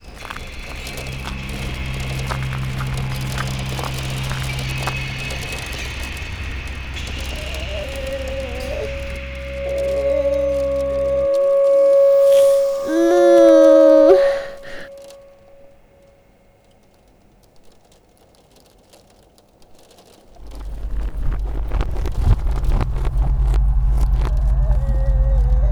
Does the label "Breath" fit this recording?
Yes